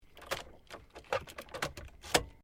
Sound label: CAR SUBARU LIBERTY INTERIOR KEYS POWER ON; Family members Subaru; Cars (Sound Effects:Vehicles)